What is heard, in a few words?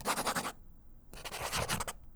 Fine Felt Tip Scribbles; Books, Paper, Writing